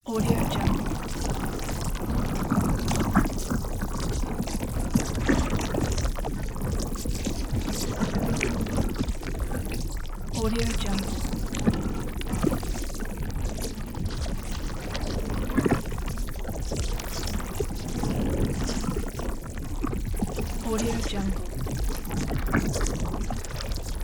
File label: alien atmosphere